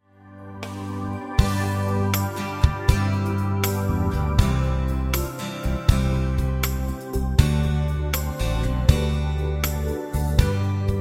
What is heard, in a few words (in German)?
keine angst playback ohne floete